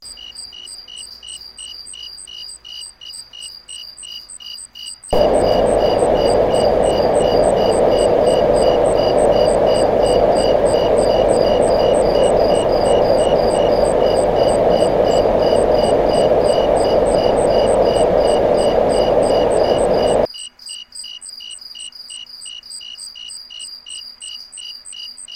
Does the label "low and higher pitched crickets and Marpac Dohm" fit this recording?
Yes